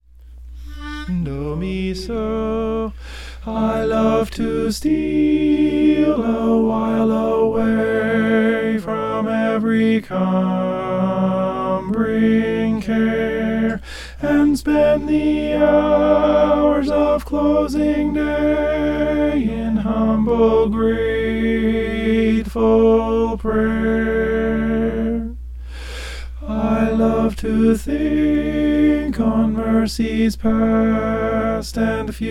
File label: i love to steal tenor